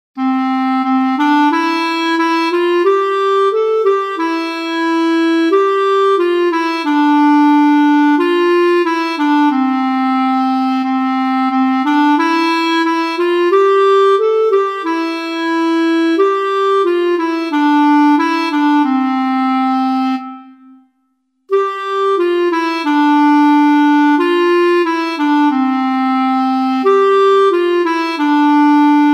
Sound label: clarinet; long long ago